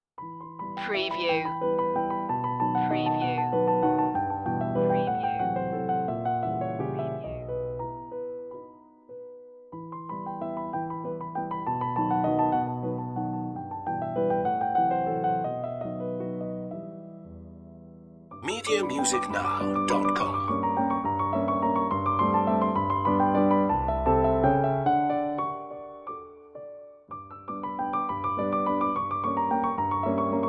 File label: waltz piano